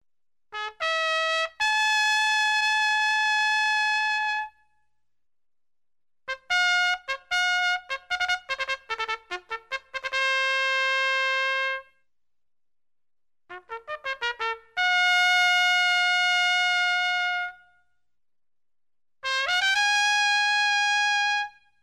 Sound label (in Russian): fanfari na trube